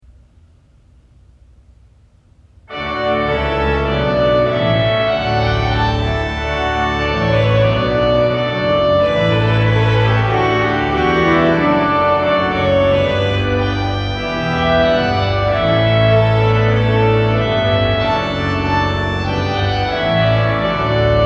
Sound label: Trumpet Tune in D